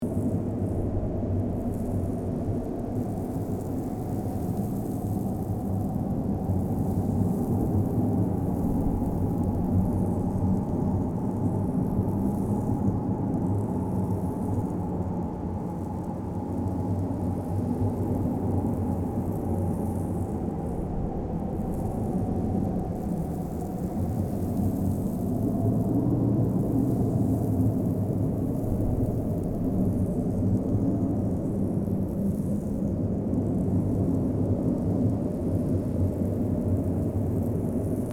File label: Ancient ruins desert ambience loop; nature